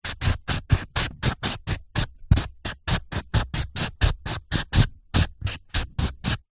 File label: office marker pen strokes on cardboard sheet